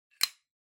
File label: Lighter (Close)